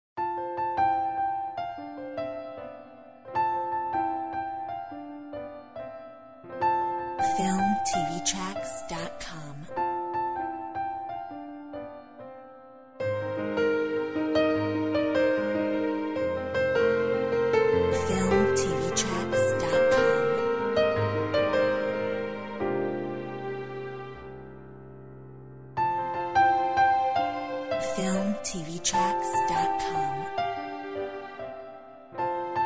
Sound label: Orch